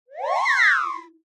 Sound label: cartoon slide whistle reversed chorus delay funny